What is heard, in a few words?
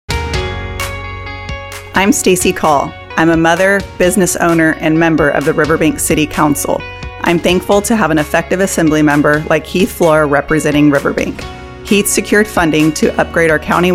MUSIC